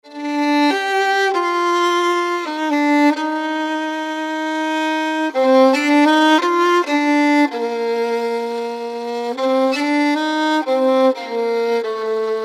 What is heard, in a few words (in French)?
Le violon